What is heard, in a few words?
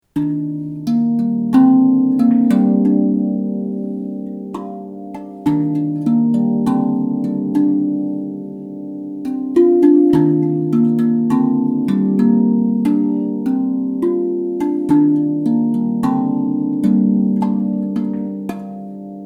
pygmy